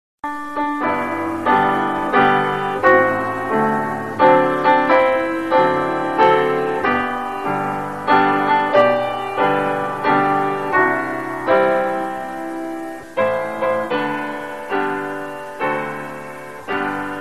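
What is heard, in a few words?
happy birthday piano ringtone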